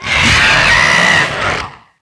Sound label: phoenix hit; DAoC